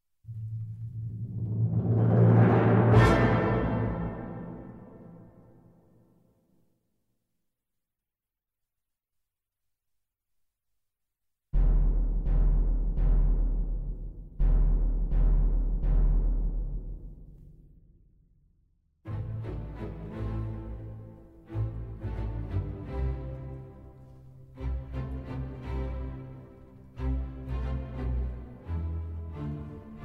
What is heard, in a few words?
Grieg piano concerto